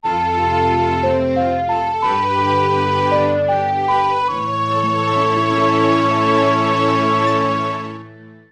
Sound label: Get Fairy Ocarina; Zelda - Ocarina of Time